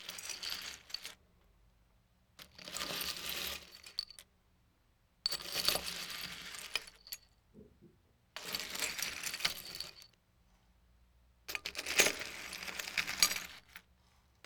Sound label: Glass Moving